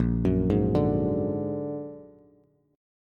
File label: Bb Augmented 7th Bb down Bass; Bb+7; arpeggiated; JGuitar